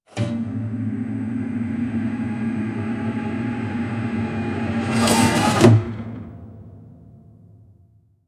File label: a medieval instrumental, instrumental